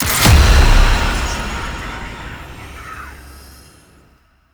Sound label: Vendor Conquest Start, UI; Entrati